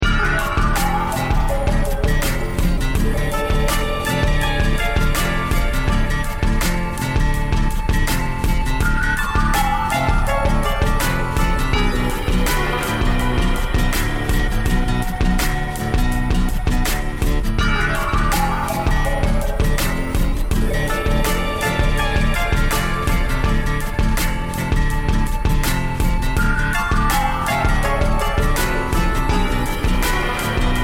bgm dungeon; goken

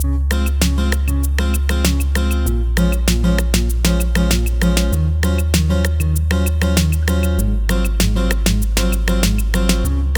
Pause Menu; Friday Night Funkin' Vs. Cyborg

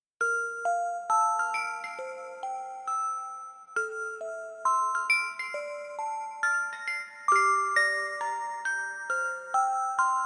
Fireplace

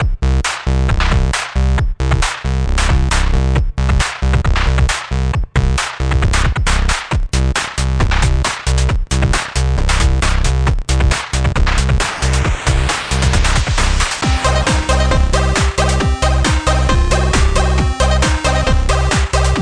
Robot Loop; breakout defense; 3ds wii u

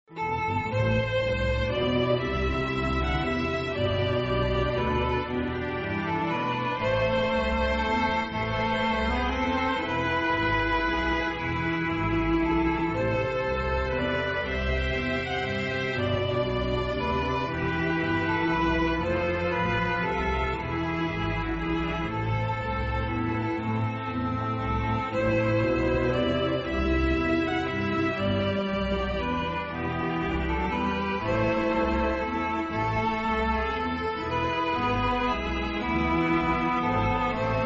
What Child Is This (orchestral)

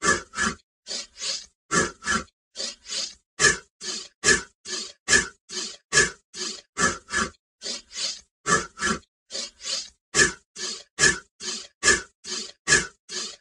Beat Hi; windows gamerip 2014; gauge

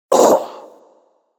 Damage (Voice); children of morta; Scorpion King

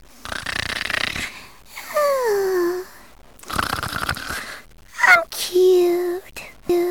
Snore; Nermal

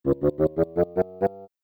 Sound Bomber - Game Over; Mario Artist; Polygon Studio; N64

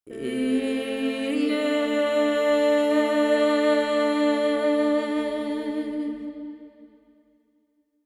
singer, soundtrack; fatebinder; Tyranny Gold Edition

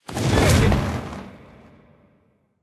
Teleport; Hero Jacko

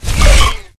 Weapons Shock Rifle

